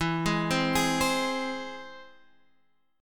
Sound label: Ab Augmented, E